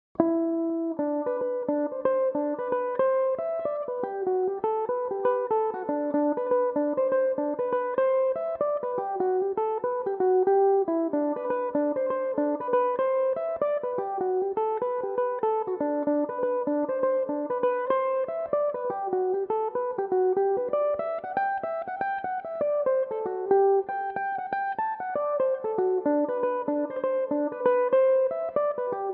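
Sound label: G major, mandolin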